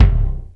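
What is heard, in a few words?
Tom, Roland S50 Percussion; Drum Samples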